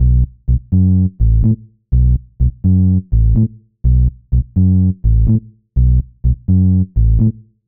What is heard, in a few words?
Bass Loop 130; Music-Fx Loops 130; Equipped Music; Loungin House